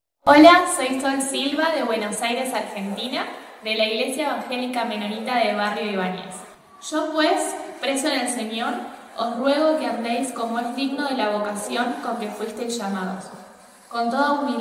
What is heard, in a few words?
vocals